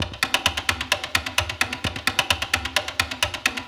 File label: 130 Fast Knocks; Touch Loops; LA Beat Scene MiniPack